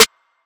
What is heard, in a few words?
snare